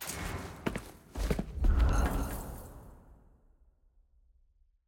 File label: click; archive jinx